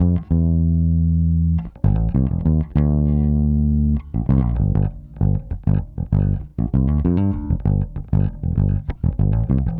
Smooth Groove Bass, 70s Analog Funk; Sonic Foundry (Sony Creative Software); What it Is!

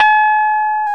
KEY MKS20 E, MKS20 + EP; Roland L-CD701; Pop Pianos 2

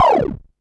Perc Synth Raw

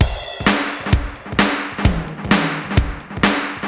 Space Echo HR-16B 130BPM; musicradar; cyborg beats

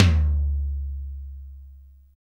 DRY TOM; Drums In Your FACE!

